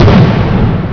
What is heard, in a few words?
EXPLODE; greed